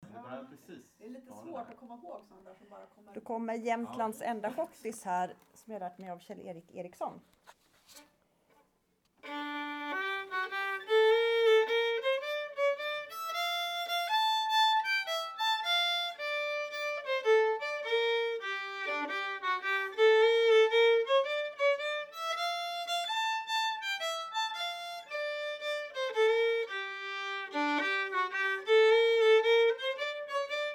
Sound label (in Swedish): Jämtlands enda schottis